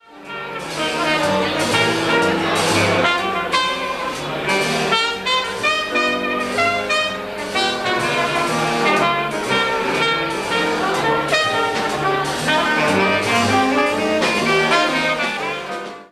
jazz quintet piano bass drums trumpet saxophone

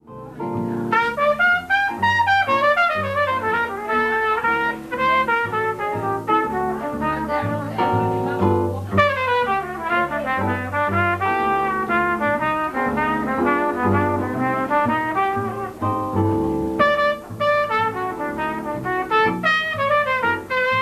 jazz trio piano bass trumpet

